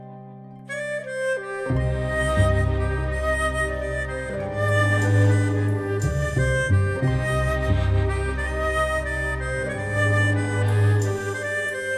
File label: BGM RINGTONE